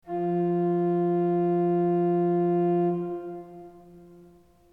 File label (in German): ton, orgel; Spielereien auf der Bildschirm-Tastatur